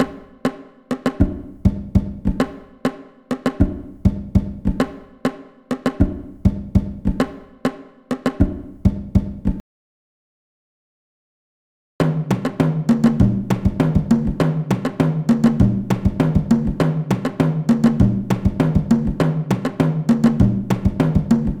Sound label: Katana Drums 100 BPM; звук барабанов катана